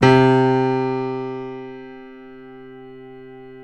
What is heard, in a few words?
PNO FF; Synclavier Sampler Library